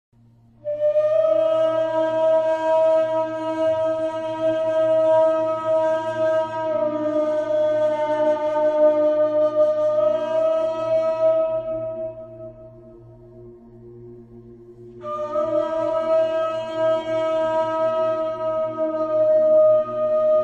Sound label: Solo Nay